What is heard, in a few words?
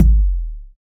large room kick drum sample F key; kick drum samples